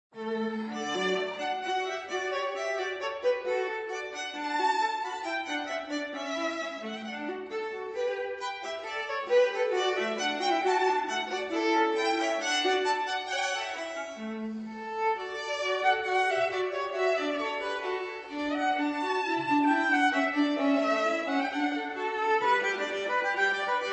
Duo for Violin and Viola Moderato; loops

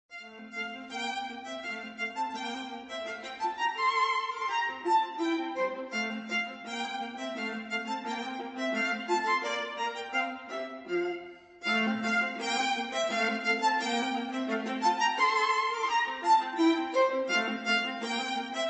Duo for Violin and Viola Rondeau; loops

